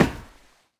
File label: Run Metal; Step